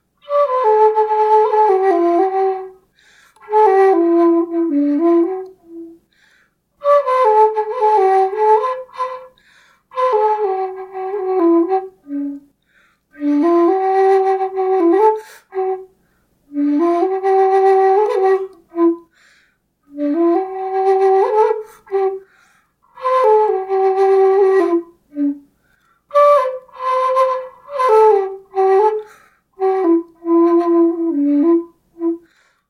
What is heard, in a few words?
bamboo flute, music samples; Coyote Oldman Anasazi-style end-blown flute, 2011.